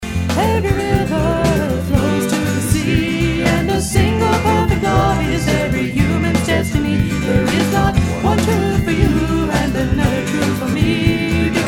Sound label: Music—1 dB peak 1/3 octave wide, at 2500 Hz.; equalize in 1/3 octave bands